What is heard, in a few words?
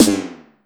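snare processed, Disco House; USB Soundscan vol.20; Fresh Disco House I; AKAI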